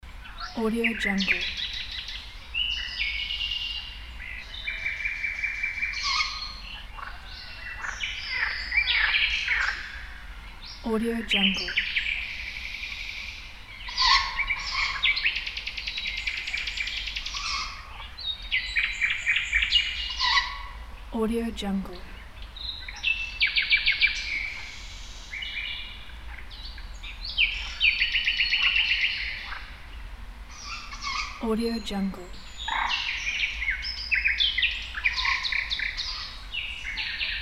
night forest; nyborgsound

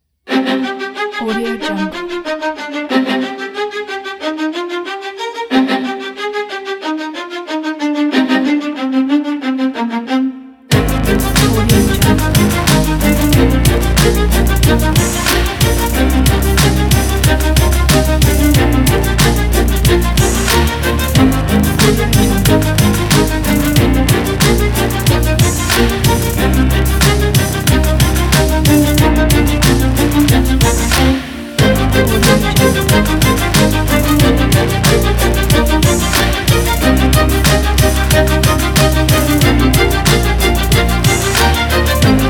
epic inspiring hip hop

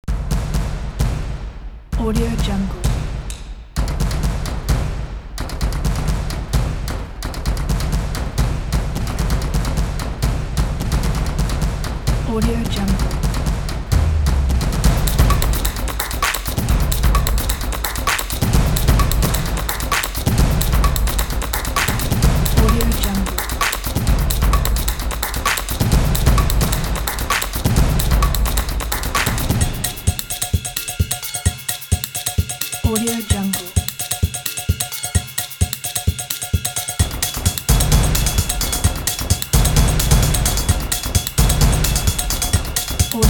stomp steps

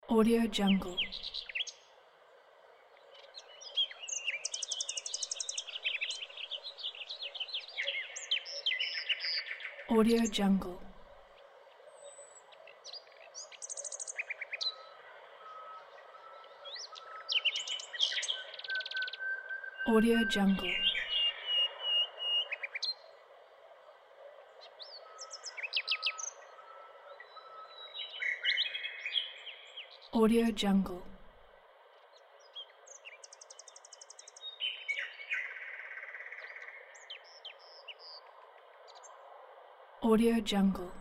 birds; lodosound

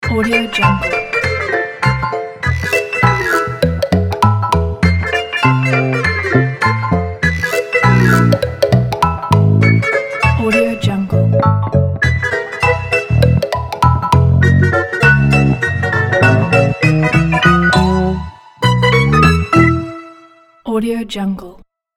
funny picture ident

